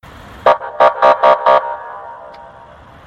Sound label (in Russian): zvuki sirena